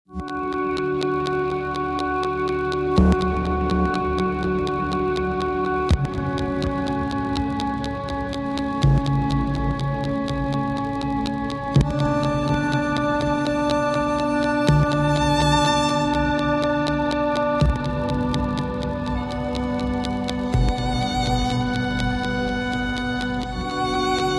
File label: Violin